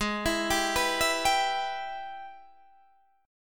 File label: Ab Minor Major 7th Sharp 5th, Guitar, Open D; 18,19,17,17,19,17; down